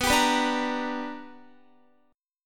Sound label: B-Minor Major 7th Sharp 5th B down Guitar; strummed; 4E,4A,5D,5G,5C,6F